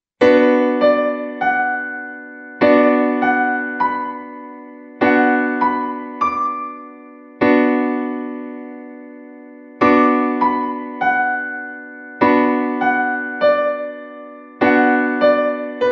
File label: Bm Piano Keyboard Chord